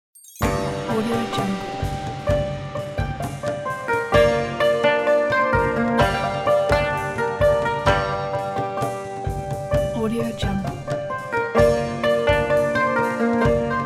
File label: japanese tradition